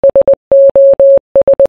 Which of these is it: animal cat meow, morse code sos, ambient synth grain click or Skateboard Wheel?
morse code sos